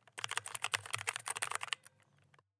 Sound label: red switch typing